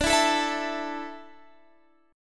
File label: A Suspended 4th D